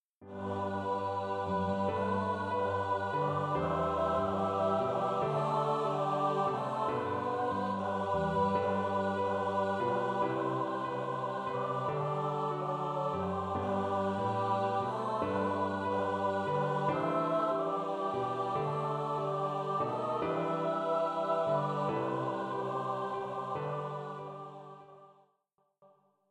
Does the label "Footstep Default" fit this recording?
No